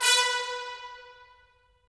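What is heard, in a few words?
brass trumpets staccato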